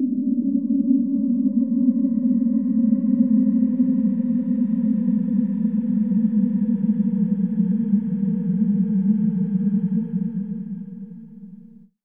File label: UNDERWATER; AMG - Vince Clarke Lucky Bastard